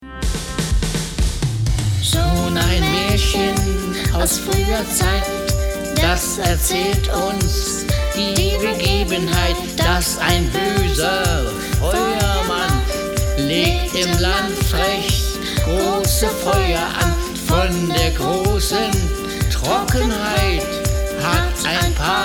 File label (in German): Klimaglocken drums